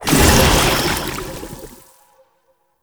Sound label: Projection Spawn; Tau; Somatic Bearer